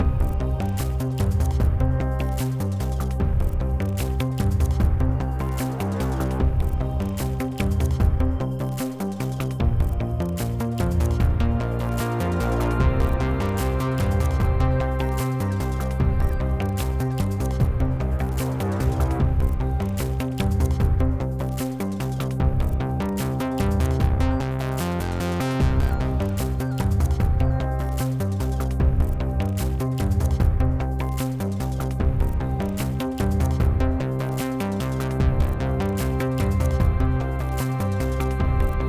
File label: Puzzle 8CH 75BPM; Benefactor